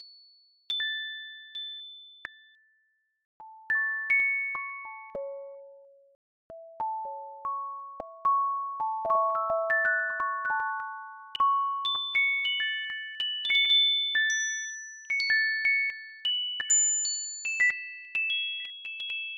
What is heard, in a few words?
rain, synthesizer; lange-nacht-2009